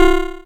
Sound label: dry perc, Quasimidi Rave-o-lution 309